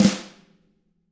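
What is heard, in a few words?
TAMA snare, flam; ILIO; Double Platinum Drums 1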